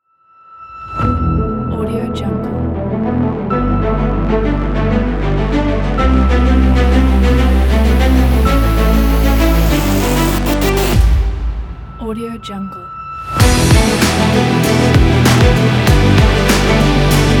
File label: epic sport music; audioenergy